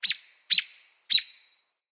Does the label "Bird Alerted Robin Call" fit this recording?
Yes